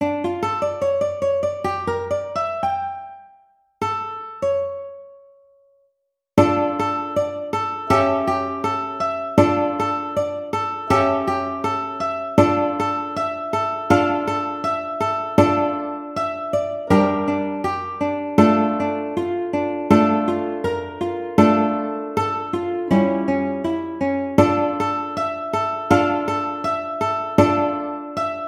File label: Sakura Sakura Conductor Ukulele